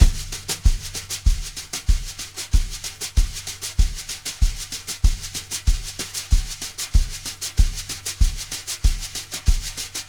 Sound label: BRUSH LOOPS, 95 BRUSHIN